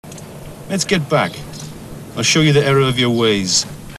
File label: error; catseyes